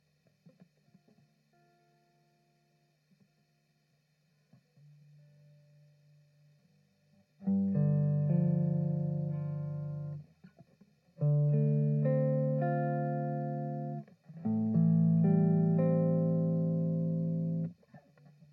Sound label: Dm112 Seven Four Groove